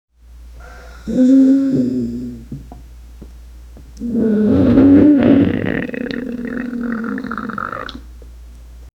belly rumbling laxative

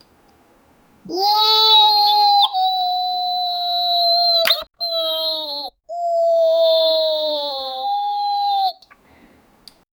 a short vocal sound of, sport

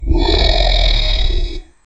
a deep dragon roar that; dragons

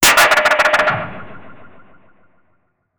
generate the sound of loa, armor

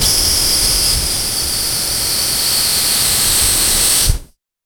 the light noise of a, balloon

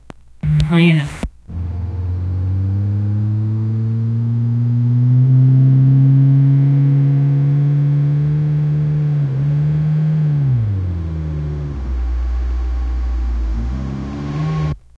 from inside a moving car

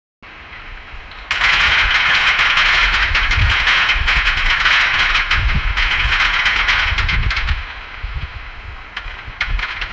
football pads

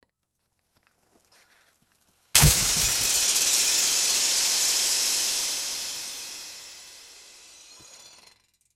deflation of tires, vehicle

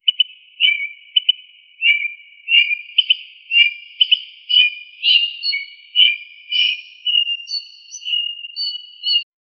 forest time lapse, bird chirps

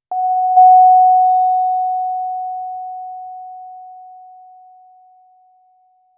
short calm, alerts

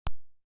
button click for game button

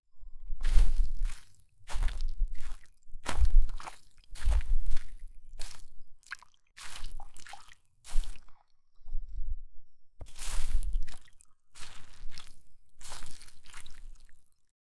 lightly waking into hot s, spa

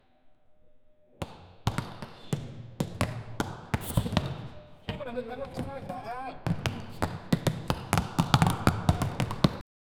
volleyball ball, beach

